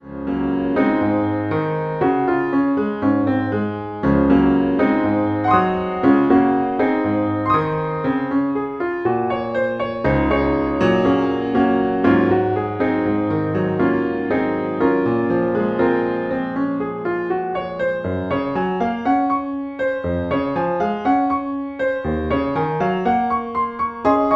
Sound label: advanced piano solo